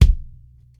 small reverb bass drum single shot C key, kick drum tuned to the C note